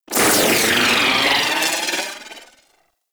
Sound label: Briefcase Launcher Proj By; Warframe; Weapons; Corpus